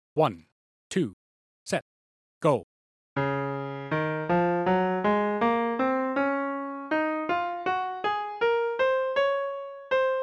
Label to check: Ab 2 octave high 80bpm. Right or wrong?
Right